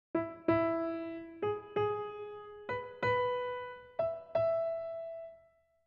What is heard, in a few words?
motives; 01 - E Major 2/4